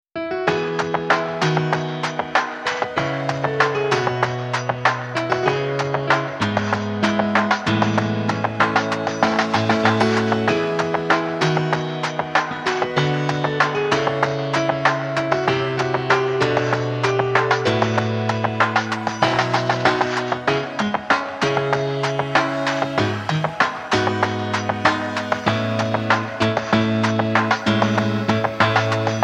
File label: bgm